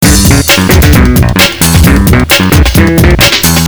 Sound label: Loop